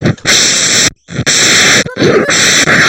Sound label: blow